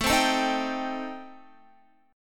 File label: A-Minor 7th Flat 5th, Guitar, chord; Am7b5; strummed; x,12,12,14,15,15